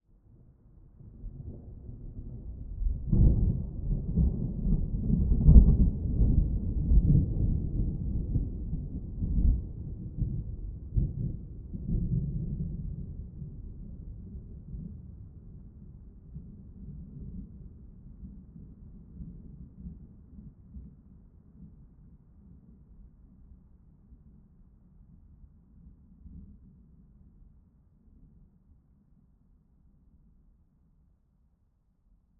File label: Thunder distant rolling; boom library; Thunder And Rain